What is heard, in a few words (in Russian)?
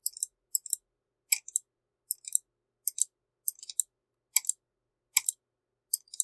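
звук щелчка мышью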